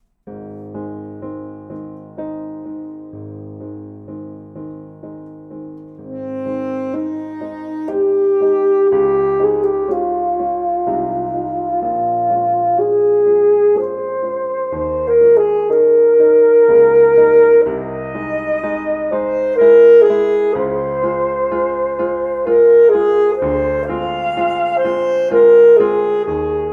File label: Andante